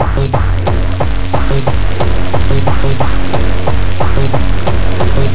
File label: KKaoss(90), Processed